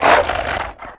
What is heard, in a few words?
zombie dog hurt; player; voice